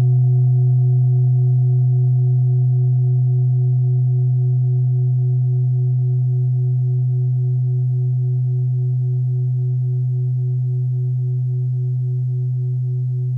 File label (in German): klangschale ladakh